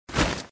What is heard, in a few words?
fight loading